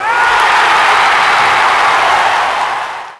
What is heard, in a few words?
cheer; WhiteOut; XBOX; crater